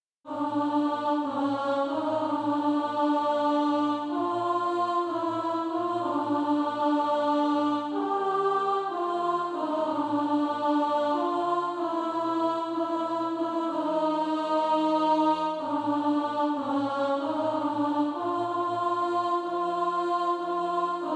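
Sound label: alto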